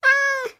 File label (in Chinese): 猫流浪者待机声